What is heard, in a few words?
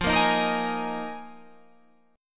D sharp Suspended 2nd Suspended 4th